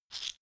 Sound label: shaker wood hit double soft